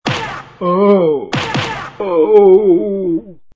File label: Punches; midi